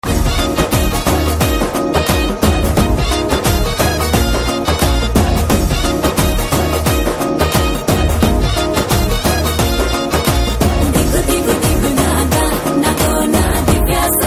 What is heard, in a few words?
BGM Mass Drum Beats